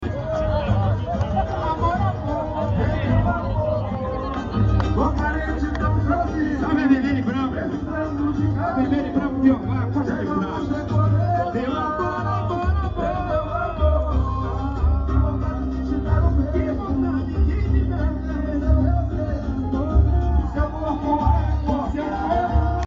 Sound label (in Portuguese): musica